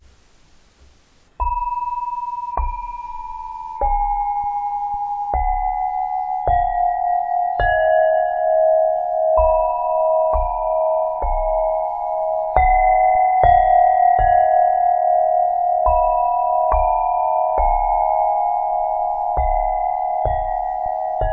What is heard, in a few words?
washed 5 mani bowl series, bowls